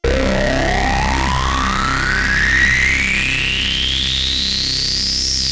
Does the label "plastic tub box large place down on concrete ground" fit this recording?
No